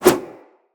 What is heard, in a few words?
Flip Whoosh; Characters